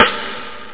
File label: stick